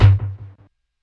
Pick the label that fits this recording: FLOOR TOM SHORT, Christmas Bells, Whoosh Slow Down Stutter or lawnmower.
FLOOR TOM SHORT